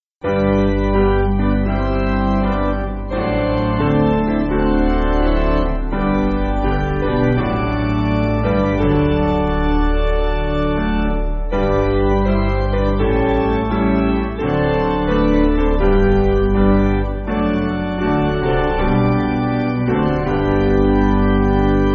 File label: OPiano; Joy Bells Are Ringing; Julen Ar Inne